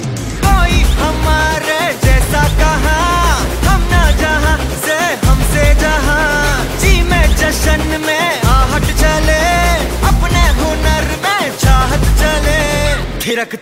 Song

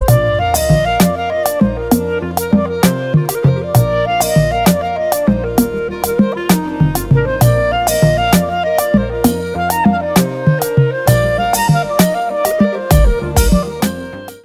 Instrumental

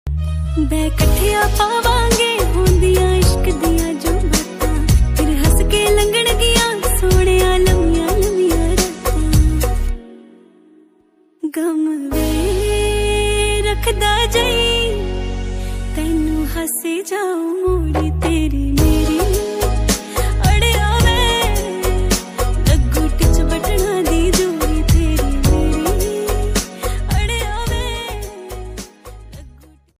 Ringtone Punjabi

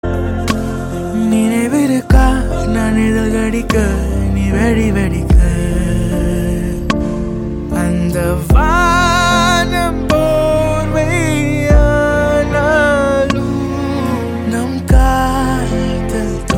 Theme Ringtone

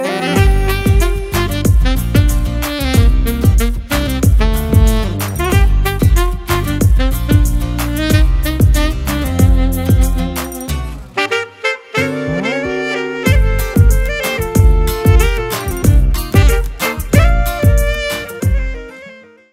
Flut Version

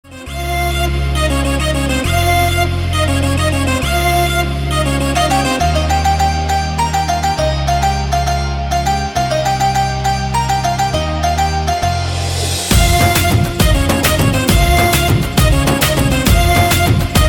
Instrumental Ringtone

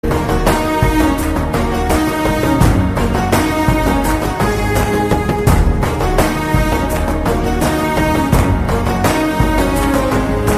Bgm; Bimbisara

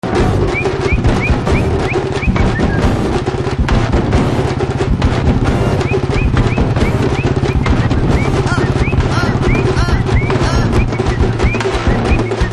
Teaser Whistle Ringtone; Dhruv Vikram; Mahaan Teaser

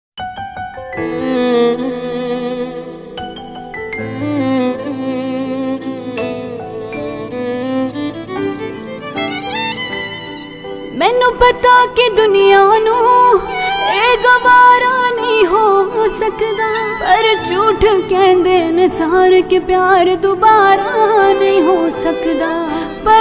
Female Cover Version

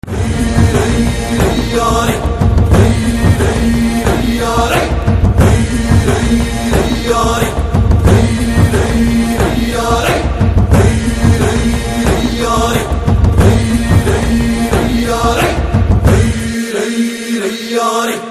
BGM Theme Ringtone

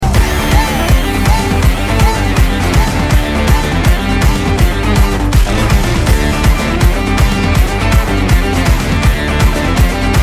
Bgm tone

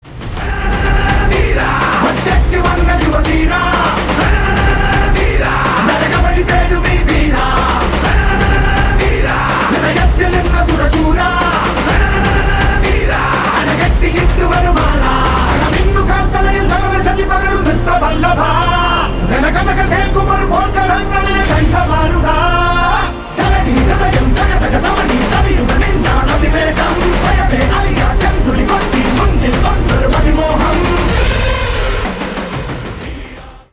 Malayalam Version

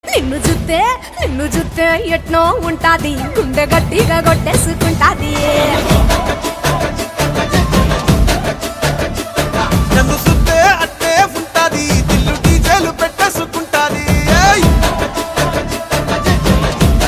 Theme BGM Ringtone; Dhamaka Movie

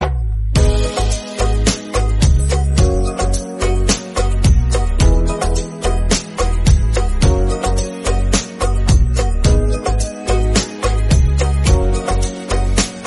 Instrumental Version Ringtone

